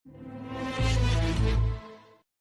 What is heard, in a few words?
commercial sound; bmw